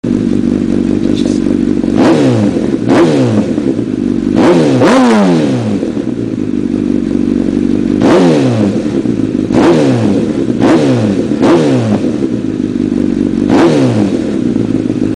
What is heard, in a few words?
CBR1000RR slip on Ti oval, exhaust sound; honda; Sato